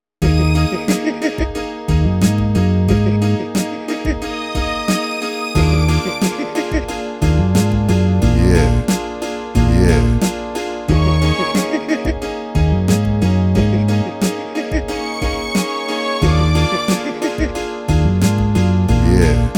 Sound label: Sinister